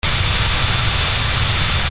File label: screamer; diabolico